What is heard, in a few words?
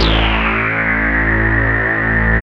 CHORD; AMG - Vince Clarke Lucky Bastard